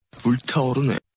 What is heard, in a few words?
ringtone